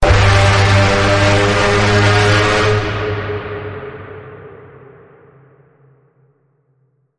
Bend

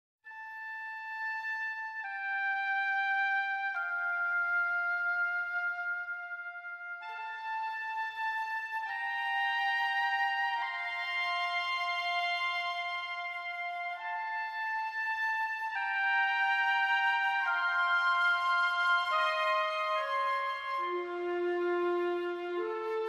Woodwinds Only

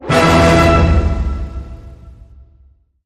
Hit Orch Glam Triple E

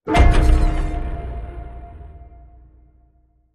Broken Piano Boom

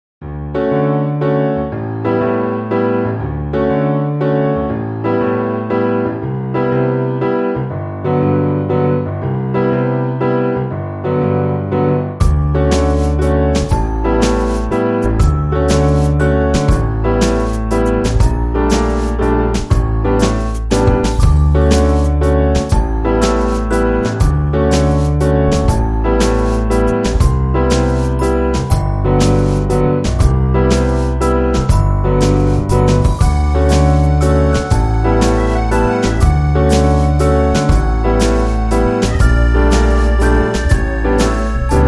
Waltz

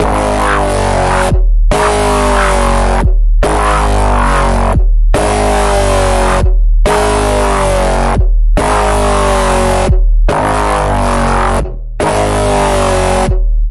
Pulse, G sharp

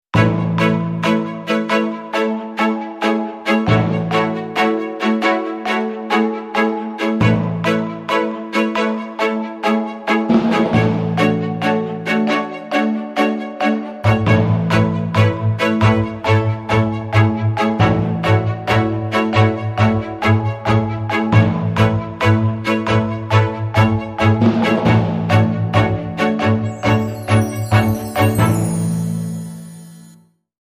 Underscore

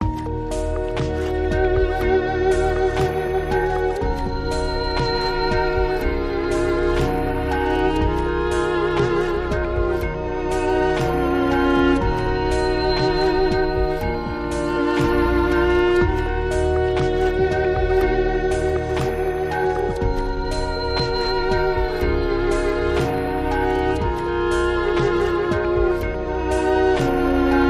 Dark Electronic

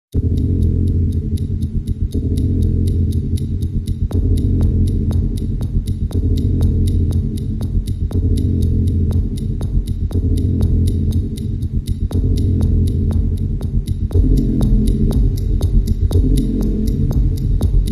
Dark Ticking Drone Reduced

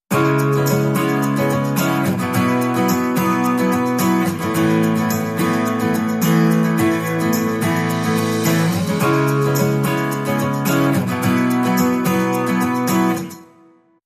Positive Motion

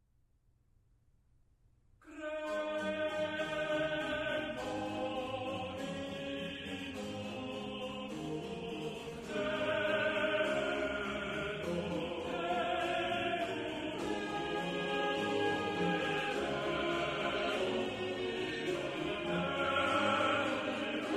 Chorus; Credo in unum Deum; BWV232

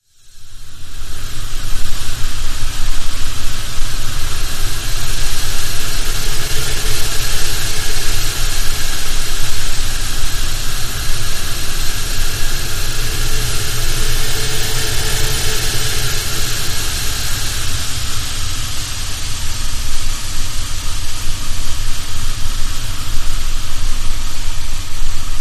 Winds Atmosphere; Gameday

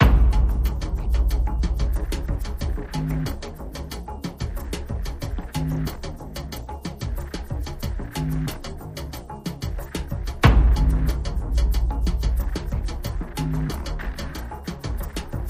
Masala, No Pads

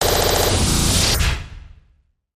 Rapid Fire Noise Zap

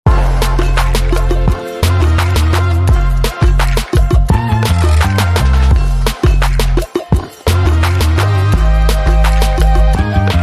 Funky Trap

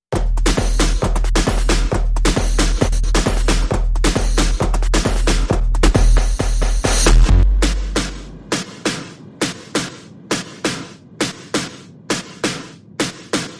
Drums and Perc Only

